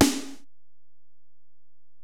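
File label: Acoustic Snare, Alesis DM-PRO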